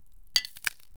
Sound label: ice shard forming; creation